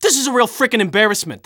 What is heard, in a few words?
scout jeers